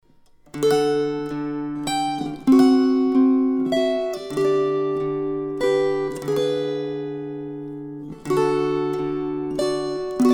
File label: String Choir